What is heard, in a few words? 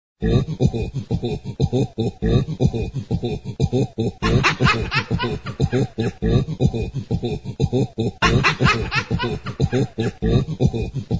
Laughter; Sansonia